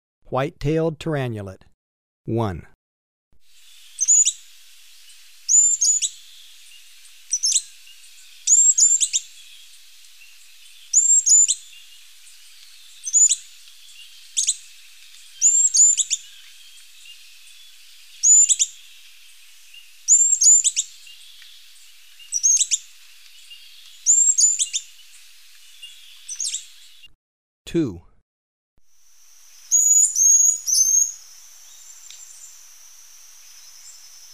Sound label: White-tailed Tyrannulet, Birds of Ecuador; S. America